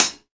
tamed hi hat sound e key, Royality free hi hat closed sound tuned to the E note